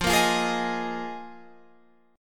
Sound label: Eb Suspended 2nd Suspended 4th